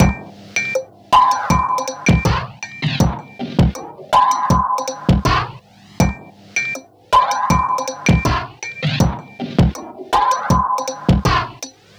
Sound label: Creeper 80 BPM